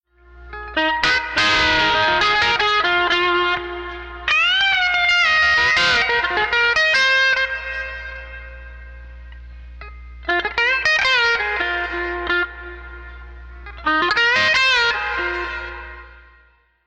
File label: Muddy, pedal steel